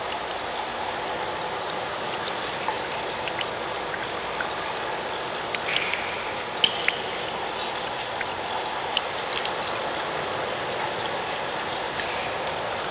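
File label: camp inside sewer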